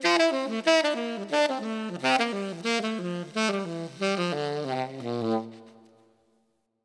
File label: Arpeggios Down G Maj 12 beat 90